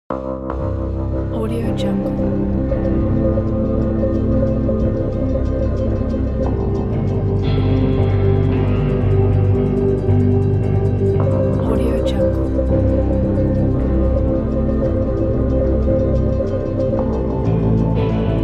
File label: dried cinematic guitars